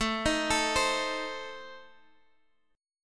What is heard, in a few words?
A Diminished A Guitar Standard, chord; x,x,7,8,10,8; arpeggiated; JGuitar